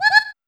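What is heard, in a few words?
synth effect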